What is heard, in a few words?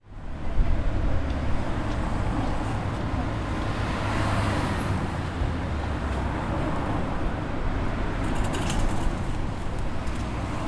Trafic